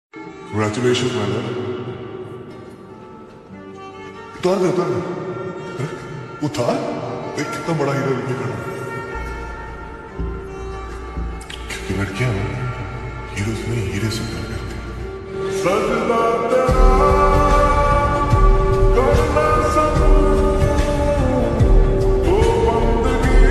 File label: sad song